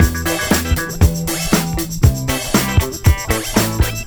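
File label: CLAVI D, 118 bpm; USB Soundscan vol.32 - Roots Disco; AKAI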